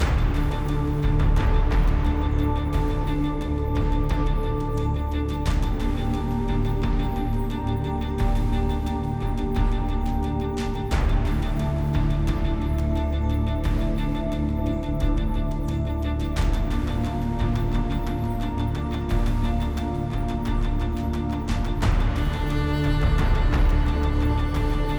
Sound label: Battle Bloodguard Combat; Eon Altar